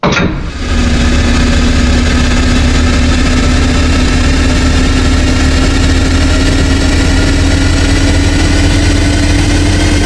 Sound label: accelerator